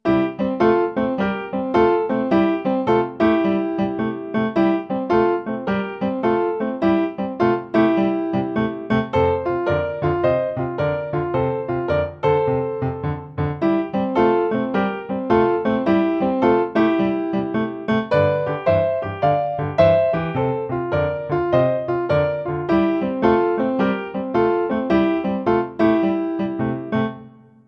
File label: Complete left and right hand, piano blues